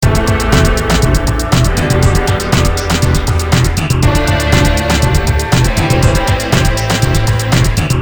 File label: depressing rock music